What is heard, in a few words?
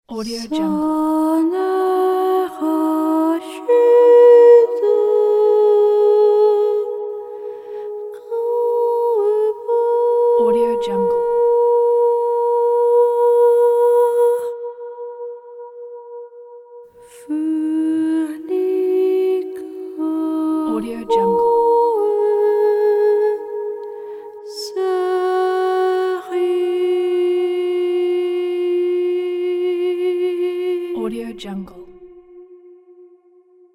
a cappella